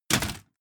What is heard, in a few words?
step armor fullplate